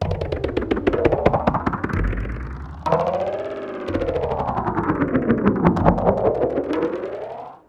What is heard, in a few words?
PERCLOOP ETHNO 125; eJay